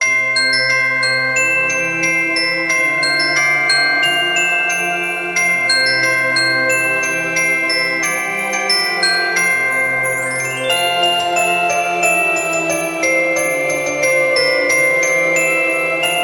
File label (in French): musique